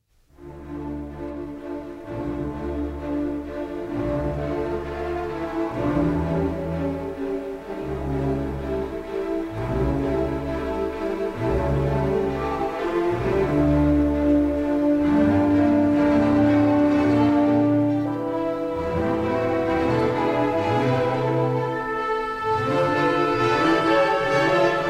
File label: Piano Concerto in D minor; Mozart, Wolfgang Amadeus; No. 20; K 466